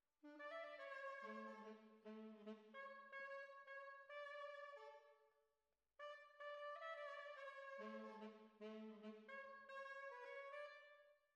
Trumpet

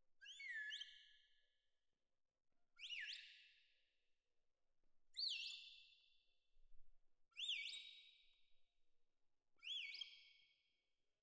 Perc Main

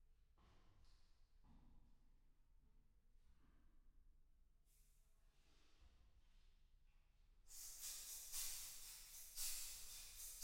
PCM 70 Shakers

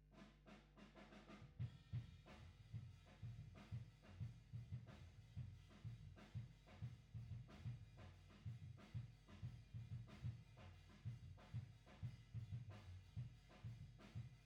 GTR B

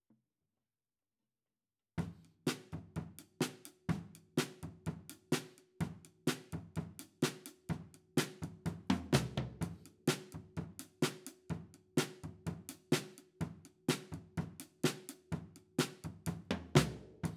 Ride

